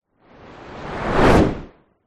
whoosh fast; sound design

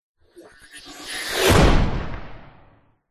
science fiction gun power up then shoot; zapsplat

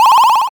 Pickup

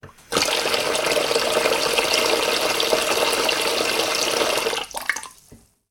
household sink water fill bowl; sound effects one

